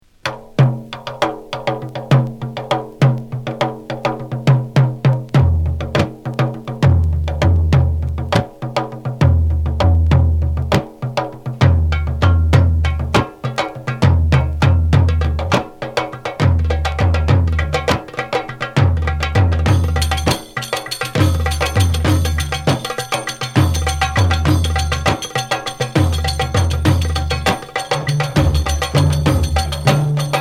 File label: lebanese dabke orchestra